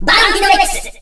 ROCK

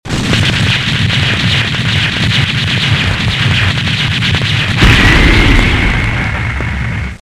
explosion

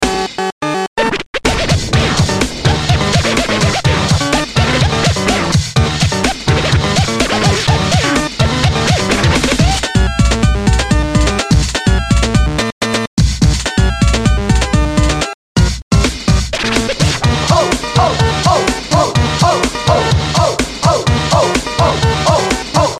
Techno; Mario

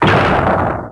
ROCK

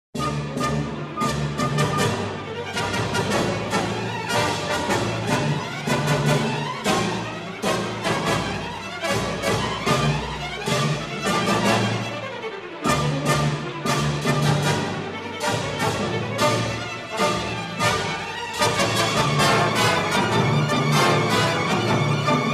Dramatic Cue; Ren & Stimpy - Production Music

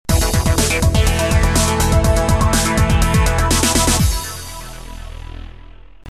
Game Over; Genesis; Streets of Rage Remake v4 Soundtrack

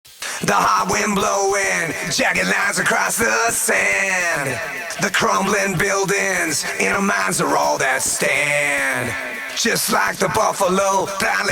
Vocals Only; Metal Gear Rising

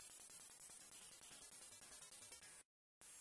Spot Fall Over; Cool Spot; Genesis

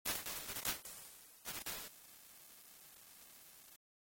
Game Over; Boogerman A Pick and Flick Adventure; Genesis

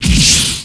ROCK

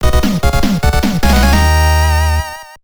Boss Fanfare; Mega Man 4 Remade

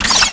ROCK

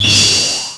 ROCK

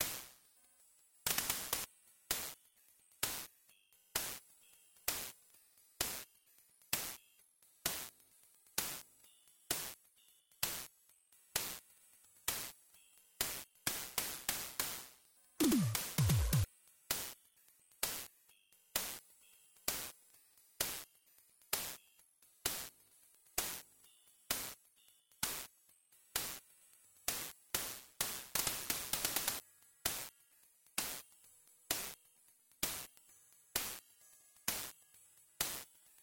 Staff Roll; Magical Hat no Buttobi Turbo! Daibouken; Genesis

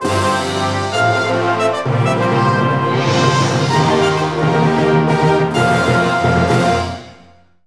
Grasp Victory, Chiptune; Final Fantasy Tactics A2 - The Sealed Grimoire

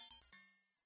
Continue Jingle; Zero Wing; Genesis

